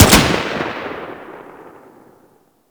Sent Elec Railgun Shot; Warframe; Weapons; Sentinel

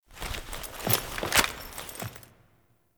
Fixing Computer Interact; Entrati Labs; Loid

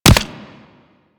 Player Bullet Impact Flesh; Warframe; Weapons

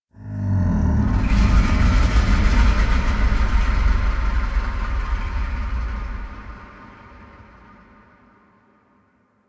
Moan Exterior, Ambience

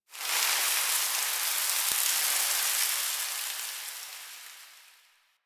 Sand Fall; Warframe; Man In The Wall

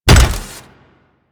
Step; Warframe; Corpus; Arachnoid Wraith; Steps

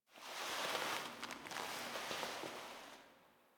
React Queens Hand Operator Foley Touch Face; Warframe; The War Within

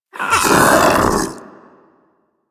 Infested Splitter Joined Vocal Attack Medium; Warframe; Grey Strain

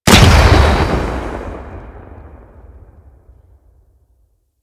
Mine Drone Underwater Explosion

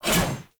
Sword Whoosh Heavy

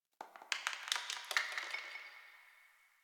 Brick Crumble; Ambience; Warframe; Nineteen Ninety Nine

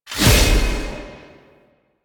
Impale Cast Release; Warframe; Warframes; Hoplite

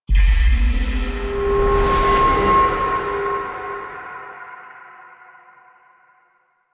Touch Helmet; Warframe; TNW Intro Quest

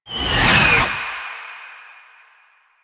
Landing Air Whistle; Chem Nox; Warframe; Quests; Nineteen Ninety Nine

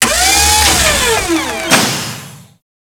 Shipyards Robot Arm Large Deactivate; Ambience; Props; Warframe; Grineer Shipyards

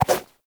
Run Whoosh, Foley, Footsteps; Warframe; Lasrian; Lasrian Soldiers; Lasrian Butcher

